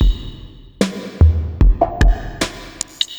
enorinea, Drum loops Electro

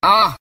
uh

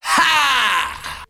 "ha", Voice

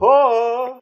"hoo" femme, voice; ooh ahh; ho hoo woo

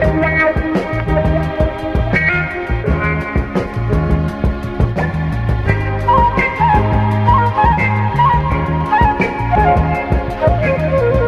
guitar and flute, Phonky Loops

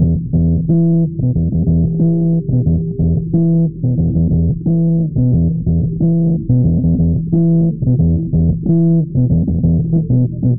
bass; Phonky

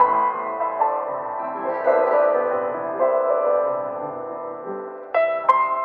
piano, Loops, Sad

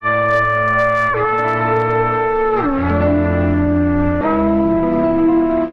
horns, Loops

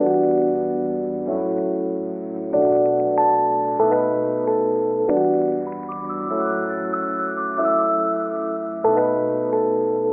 keys, Phonky loops

